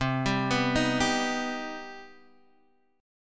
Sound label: C Minor Major 7th, Guitar, Open G; down; chord shape